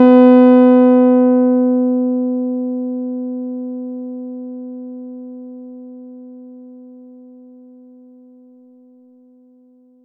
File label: Chord; ModelCycles